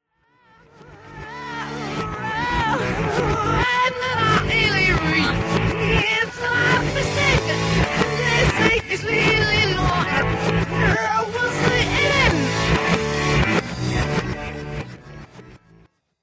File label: reverse; backmasking contrario